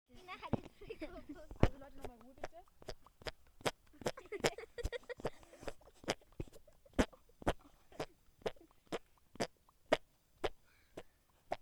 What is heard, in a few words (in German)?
achselfurzen